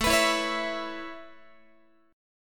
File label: A Major Add 9th A Guitar, chord; x,17,14,14,17,15; Aadd9 strummed